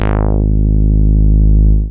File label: BAS MINI, MOOG; Sound & Vision - Gigapack II CD 1